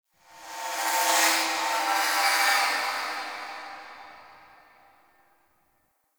Sentient Lair Spirit Chatter Two; Warframe

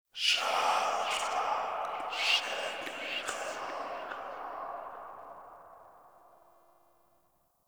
Room Whisper

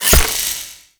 Rain Of Arrows Impact; Warframe; Duviri; Dax Archer; Abilities

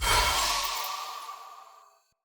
Long Sword Recall Button Push; Weapons; Player; Pothia Long Sword; Swords

